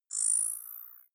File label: zoom in light; signale